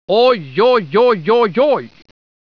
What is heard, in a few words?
OJOJ